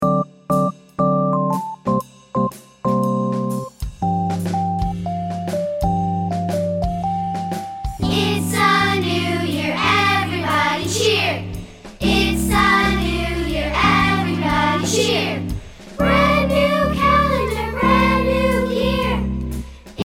january vocal

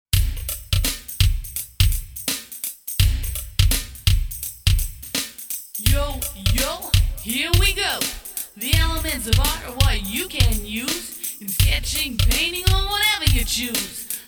elements of art rap; Mrs. Hues dances around and leads the children in the rap and song.

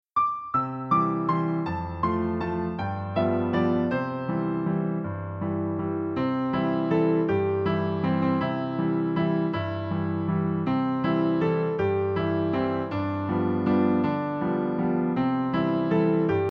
i had a rooster instr

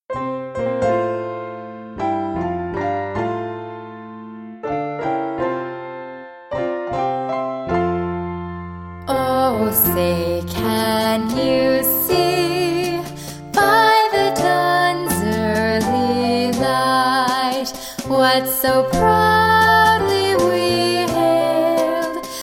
star spangled banner vocal; United States

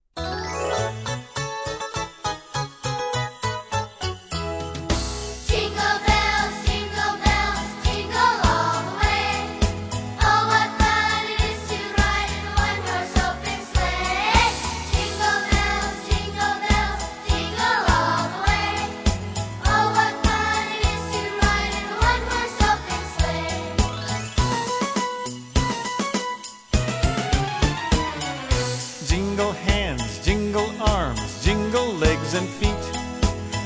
jinglebells

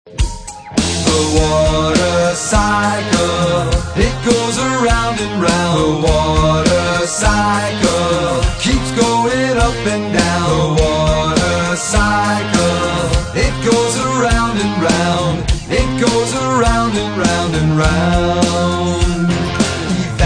watercycle, song; The water cycle – it goes around and round

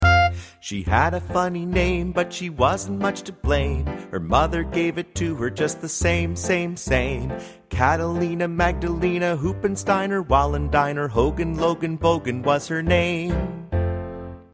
vocal; Catalina Magdalena; folk

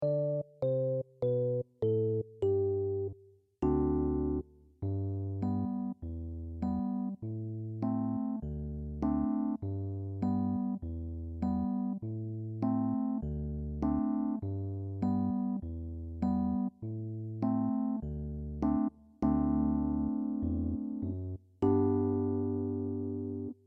keyboard bass

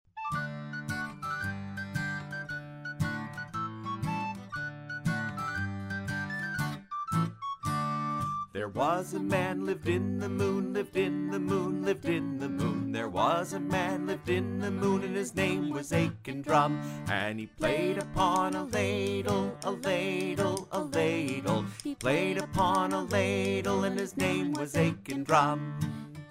vocal; Aiken Drum; folk

